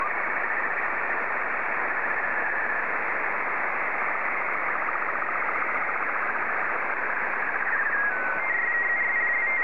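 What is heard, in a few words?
Robot 8 black and white, slow-scan television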